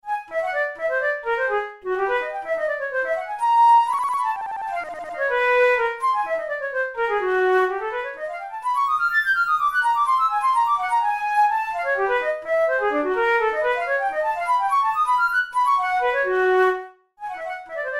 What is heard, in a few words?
studies for flute solo